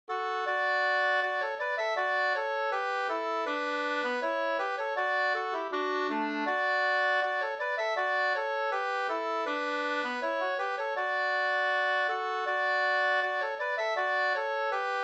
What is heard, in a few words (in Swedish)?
ganglat; Kompanimarschen; Leksand